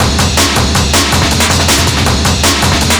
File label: Old School Break, Drums